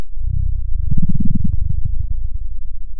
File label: pop, Sound of a dinosaur